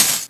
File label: Open Hihats, Cymbals; Vengeance Samples